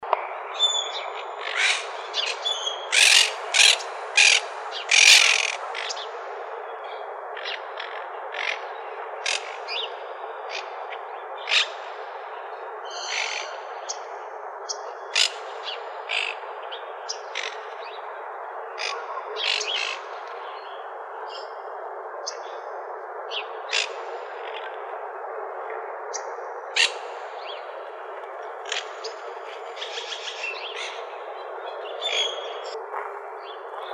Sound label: MONK PARAKEET, Parrots